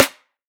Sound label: Snare